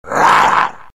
knight attack; halloween boss

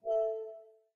windows battery low; operating systems

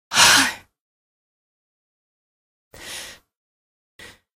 stalk breath; source engine; episodic; episode 1; citadel

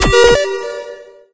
killsound retro

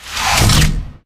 door round blue close

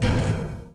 ladder, player footsteps survivor walk

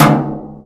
metal box impact hard

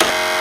restricted weapon; actions; Source Engine; admin plugin

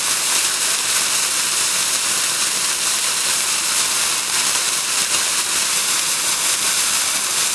bomb fuse, halloween; video games; source engine; tf

